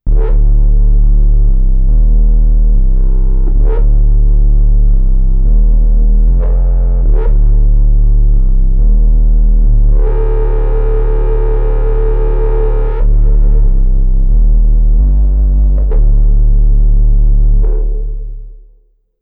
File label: hells hound